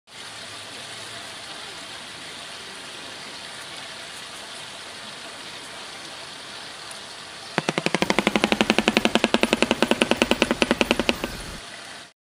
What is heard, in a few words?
bird Sound